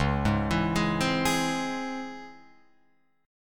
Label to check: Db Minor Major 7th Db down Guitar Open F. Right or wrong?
Right